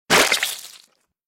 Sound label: zombie destroyed